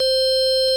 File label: ORG Vox Continental, Keyboards of The 60's and 70's